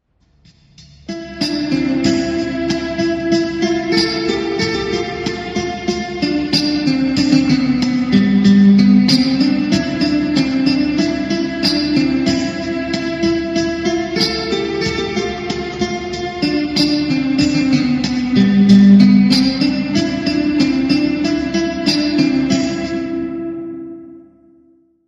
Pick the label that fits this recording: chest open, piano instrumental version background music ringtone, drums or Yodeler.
piano instrumental version background music ringtone